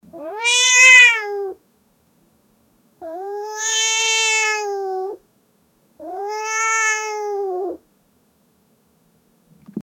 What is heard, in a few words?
cat yowling meooowww